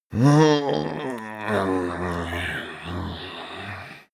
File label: male growl tired; menschen; koerpergeraeusche; soundpool